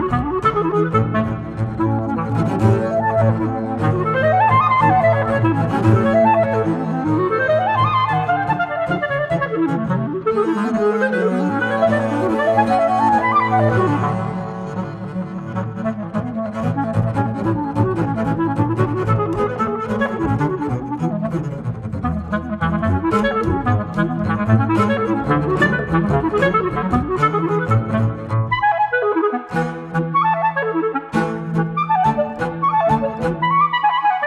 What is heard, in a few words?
Arr for Clarinet Double Bass